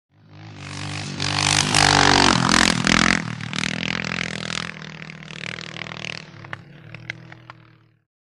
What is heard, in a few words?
Motorcycle; By; Trials Bike Up And Past At Speed.